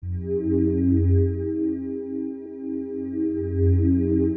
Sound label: suspense